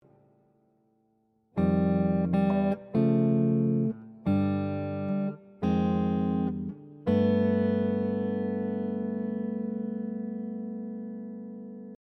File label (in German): Reharmonisation Wild; gitarrenunterricht